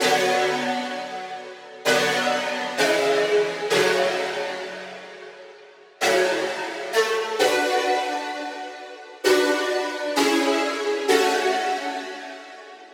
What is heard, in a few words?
Crisp Synth, F-, 130